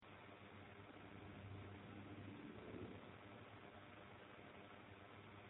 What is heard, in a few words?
strange noise; paranormal; elsings 2nd hand shop